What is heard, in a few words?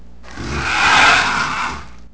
creature; The Elder Scrolls III Morrowind GOTY; XBOX